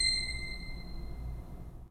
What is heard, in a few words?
3D Organs Pipe Mix Celeste